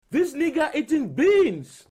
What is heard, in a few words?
eating beans